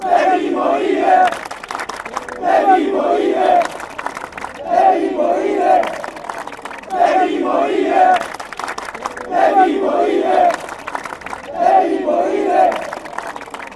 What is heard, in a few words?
you have to, fanchants